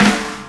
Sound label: SD HOU, Dance Mega Drums; Best Service